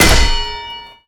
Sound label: knockout